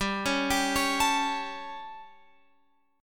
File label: G Suspended 2nd Flat 5th G Guitar Open F; Gsus2b5; arpeggiated; x,14,13,14,16,16